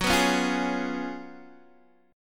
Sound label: Gb Major 7th Suspended 2nd Suspended 4th, Guitar DADGAD